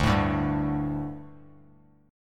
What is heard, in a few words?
D Suspended 4th D; strummed